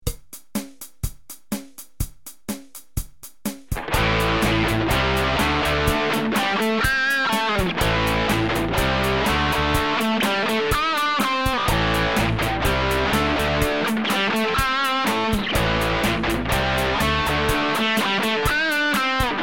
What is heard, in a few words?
jingle